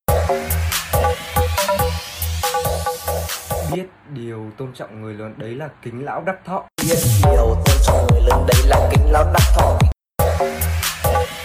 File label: remix TikTok